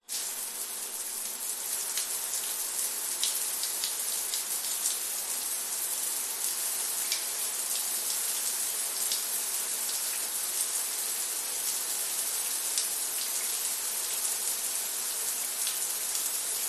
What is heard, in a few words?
household shower running tiled floor; zapsplat